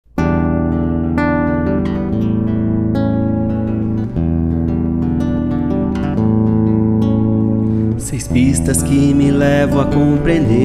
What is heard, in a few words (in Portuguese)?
violão